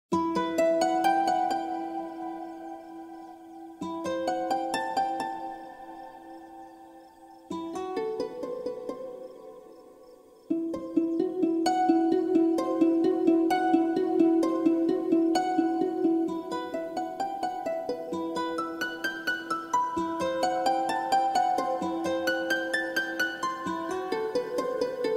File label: Arturia KeyLab Essential 61 mk3 test